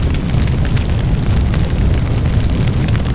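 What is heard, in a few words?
fire loop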